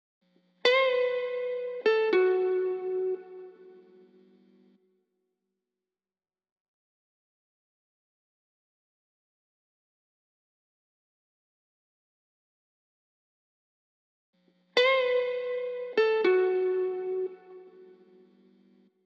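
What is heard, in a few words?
68 BPM A Major Lead, Guitar; Melodies; Loop Stems; Cymatics; GHOST (Beta Pack); Avenue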